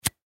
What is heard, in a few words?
Power Cord Plug In Electrical Outlet; Miscellaneous Electronic Sound Effects; Foley